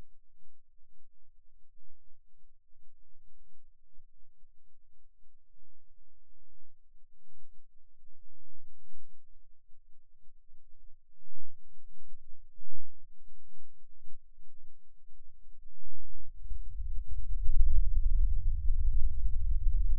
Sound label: Rhythm Seizure, eeg